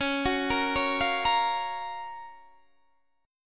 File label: Gb Major 7th Db, guitar